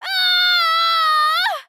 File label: impaled scream; mkx; cassie cage